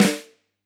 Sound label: K1 close Flam, drum